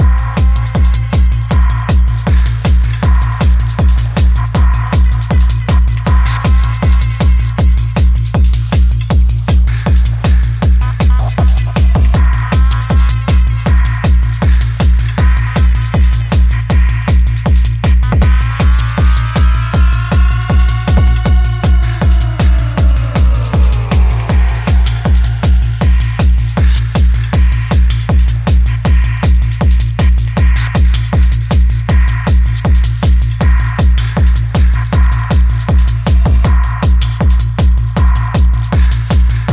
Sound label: filter bass; dj libon